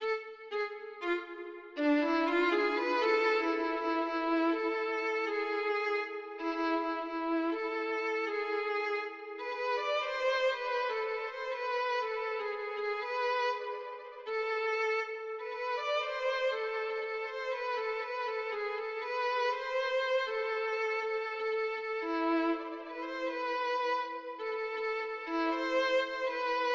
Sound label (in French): violon